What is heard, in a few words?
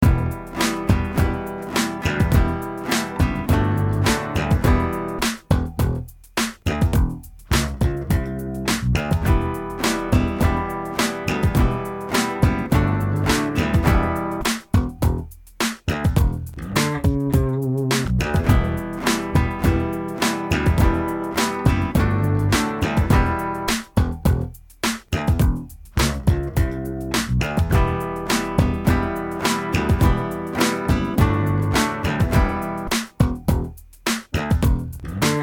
Band